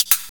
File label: kerchick echo; sgi